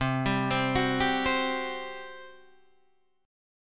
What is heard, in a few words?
G Major 7th Suspended 4th, C